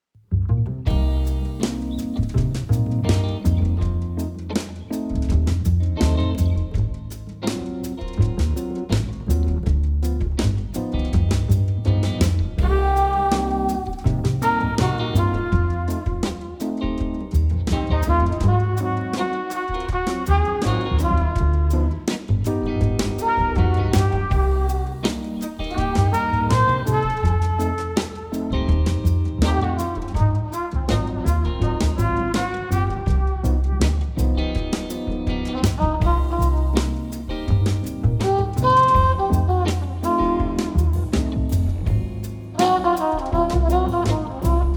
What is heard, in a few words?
Funky Elevator